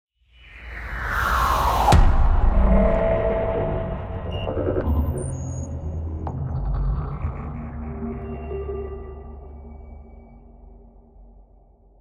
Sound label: hit; heavyocity gravity